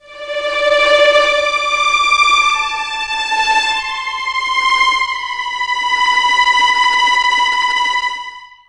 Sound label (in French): violon